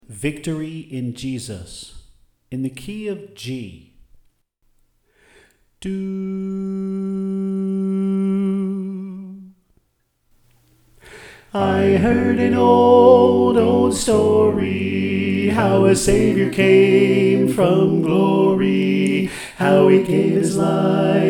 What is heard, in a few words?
Equal Mix